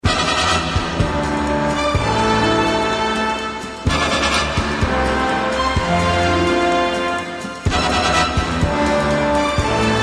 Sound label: In 80s Sounds